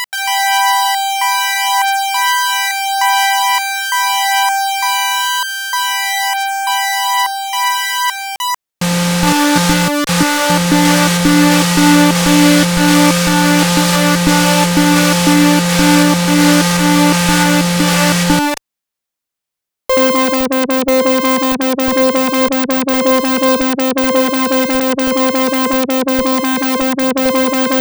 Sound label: Fire Alarms; DECO 1013 Sound Design and Sonification Assignment 1